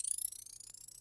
count up; Phasmophobia

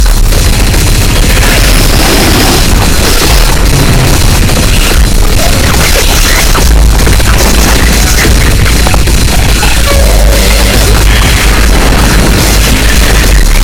bass

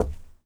FOOTSTEP Trainers Wood Boards Walk mono; Phasmophobia

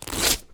Velcro; Phasmophobia

